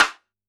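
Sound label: Snare Stick; drum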